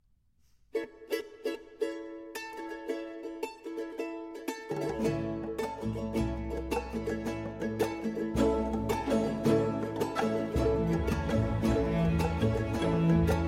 Version For Solo Violin, Vocal Quartet, Percussion, Charango, Guitar; Sanctus